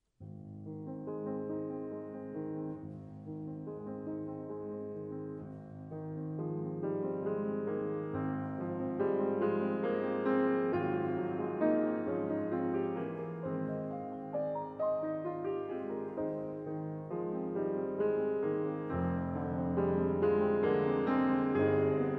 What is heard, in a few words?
romance for 2 pianos; rachmaninov; 2nd suite; op17